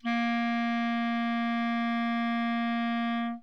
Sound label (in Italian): clarinetto